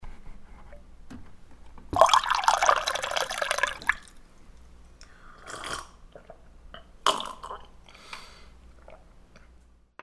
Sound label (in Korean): 물따르는소리